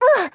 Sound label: BOSS woman hurt